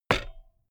bmx bike pedal grip single

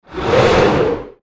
fight swosh medium fast noisy

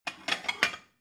plate cupboard take slide

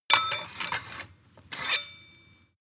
sword handle ring

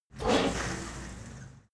cleaning machine start running stop rev up

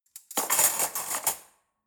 cutlery forks drawer place soft

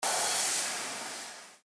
burner

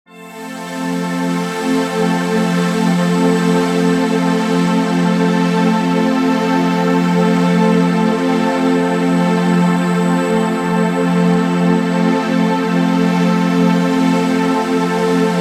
windy soft pad

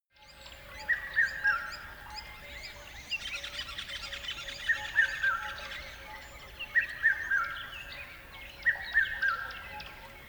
river dawn bird call

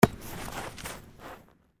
football running catch

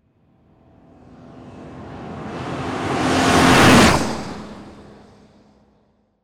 mercedes passing quickly

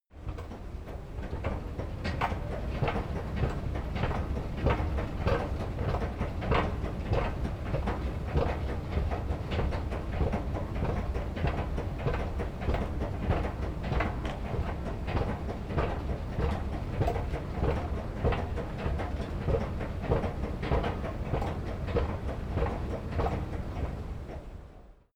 escalator

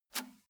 bmx bike release bar soft

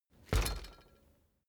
bicycle street bump front

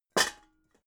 pots hit soft

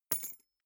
key ring small drop carpet soft

